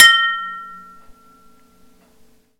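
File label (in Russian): удар ложкой по металлической крышке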